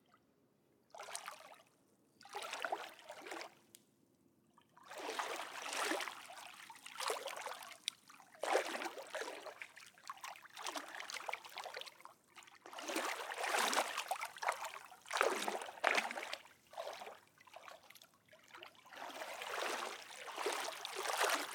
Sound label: Water Movements Swimming Around Small Splashes Random